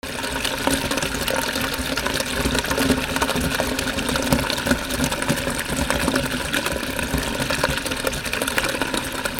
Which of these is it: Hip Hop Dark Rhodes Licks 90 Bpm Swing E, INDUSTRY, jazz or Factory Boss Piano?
INDUSTRY